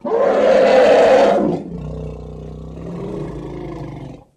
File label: Elephant Roar Growl